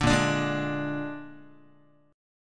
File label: Bb 7th Bb down Guitar; 5,6,5,6,6,x; 4A,4E,5A,5D,5Gsharp,6Csharp; Bb7 strummed; JGuitar